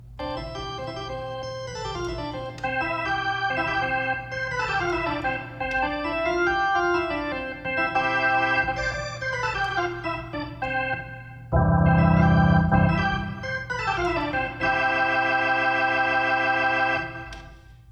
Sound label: reverb organ; smart:reverb